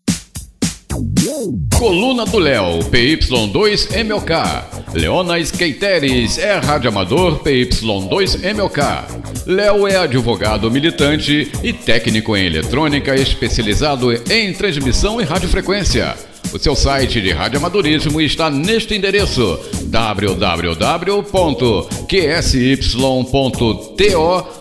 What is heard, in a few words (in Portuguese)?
Vinheta Musical